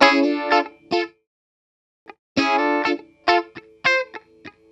102 Pop Guitar Rhythm D min, Electric; Pop Rock; Electric 100-160 bpm; Pro Tools Essential Loops; Digidesign

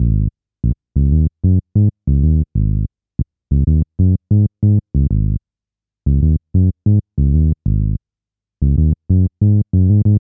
HipHop Synthbass G#Min; Digidesign; Pro Tools Essential Loops

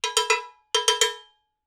110 Dance Cowbell End; Dance DJ; Percussion; BellCowbellAgogo; Pro Tools Essential Loops; Digidesign

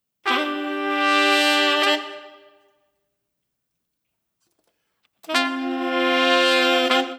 NuJazz Horns; Digidesign; Pro Tools Essential Demos; Essential Loop Demos; Demo Session - Jazz

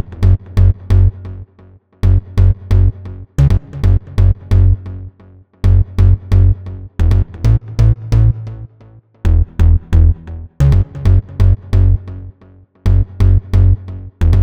133 House Bass F#min; Dance DJ; Digidesign; Pro Tools; Pro Tools Essential Loops

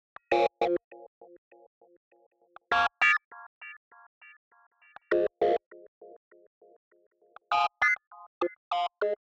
HipHop Synth F min; Digidesign; Pro Tools Essential Loops

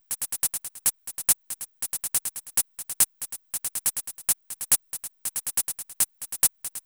140 House Shaker; Dance DJ; Percussion; Shakers; Pro Tools Essential Loops; Digidesign

